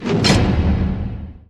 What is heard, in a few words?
Door Close; Five Nights at Candys